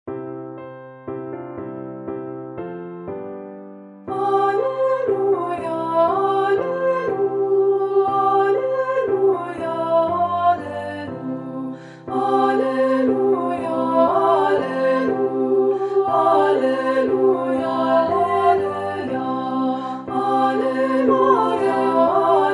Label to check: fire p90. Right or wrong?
Wrong